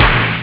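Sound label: snare drum; Amiga Soundtracker sample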